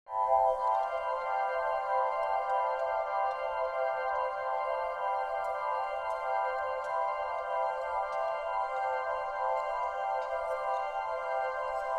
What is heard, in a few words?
ambient